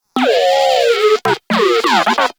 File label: Moa Compassionate Vocal Disagree; Warframe